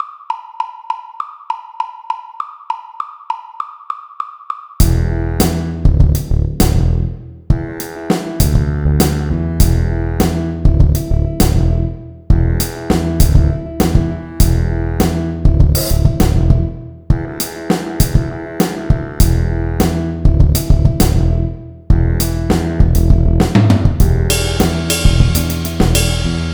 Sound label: Syncopated funky funk, drum set